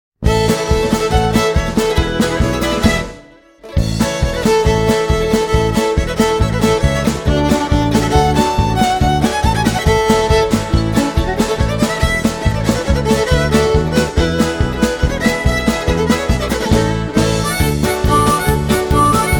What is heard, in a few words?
polka